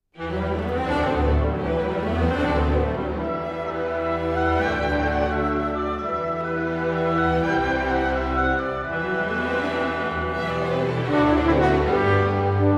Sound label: Horn Concerto